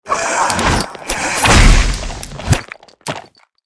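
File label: ant attack, monster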